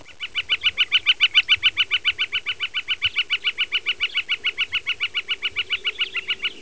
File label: flicker, birds